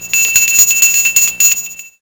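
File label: dinner bell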